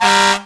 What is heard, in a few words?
auto fire truck car horn